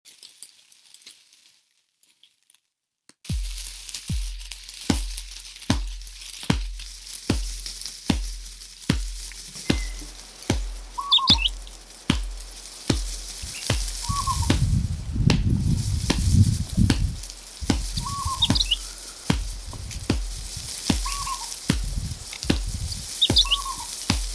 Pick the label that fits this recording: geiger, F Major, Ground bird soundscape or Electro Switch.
Ground bird soundscape